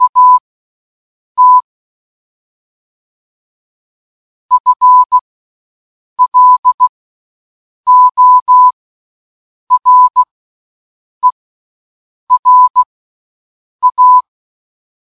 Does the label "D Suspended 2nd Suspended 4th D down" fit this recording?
No